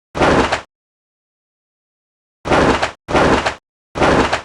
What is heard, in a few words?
showoff; portsman